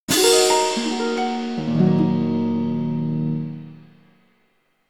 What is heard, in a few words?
magic harp crash spell